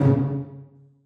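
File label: celli pizzicato c2; Sonatina Symphonic Orchestra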